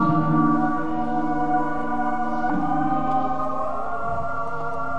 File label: angel pads